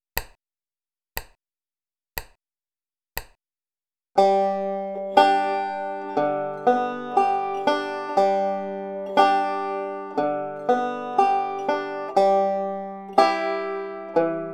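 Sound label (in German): akkordwechsel mit alternating thumb roll und pinch, banjo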